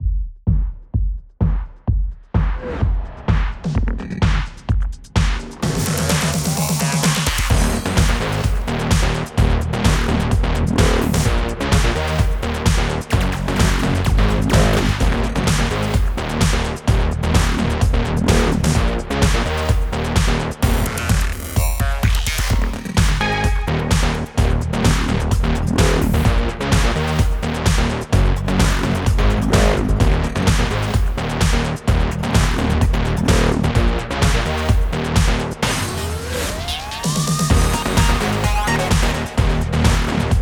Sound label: EDM Racing